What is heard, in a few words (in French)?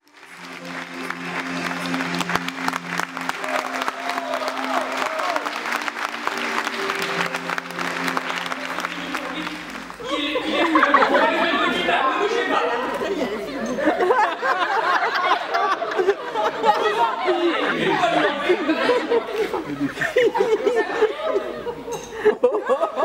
cirque